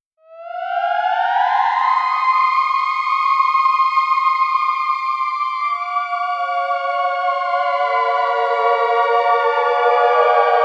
Many sustained notes, some in unison, complement and build upon each, Miniature Theremin; ringtones